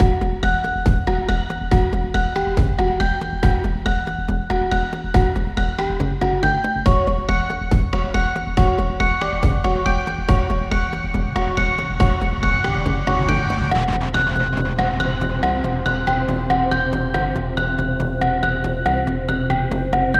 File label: Creepy Music, Musique cinématique